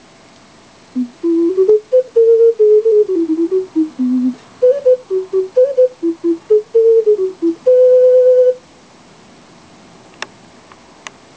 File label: ocarina